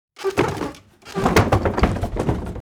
chair bump

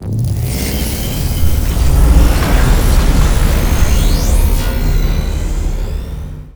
energy gather

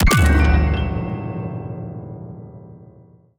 piano hit

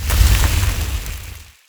bat fire, ambience

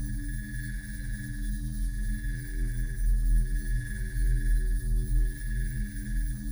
message converge loop

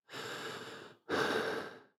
deep breath

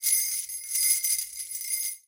bell shake

